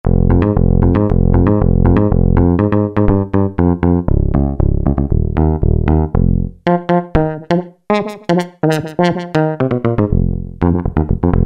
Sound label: Bass; Cheetah MS6